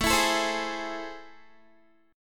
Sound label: A Minor Major 7th, down, Guitar; AmM7; strummed